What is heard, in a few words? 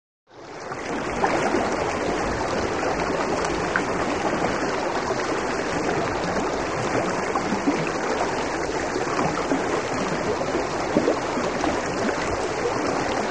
River Small Turbulen